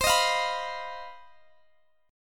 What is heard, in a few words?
C Minor Major 7th Double Flat 5th chord, Guitar Open D